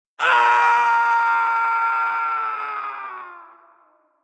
Scream Off Cliff, Male scream, falling off cliff, fading away, w. FX